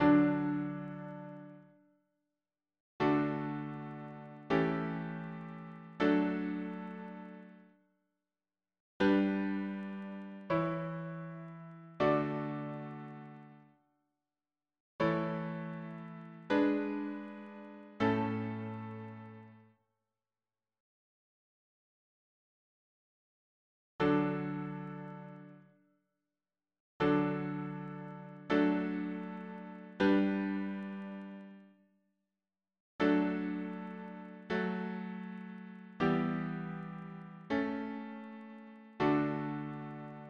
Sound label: harmony piano